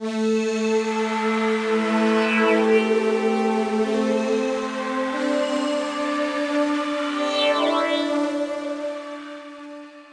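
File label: music colony ambience loop; RTX Red Rock; PS2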